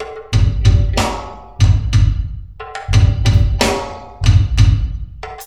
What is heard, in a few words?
DRUMLOOP, Ragga & Dub Reggae; USB Soundscan vol.27; DRUMLOOPS